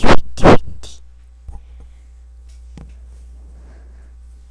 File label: windy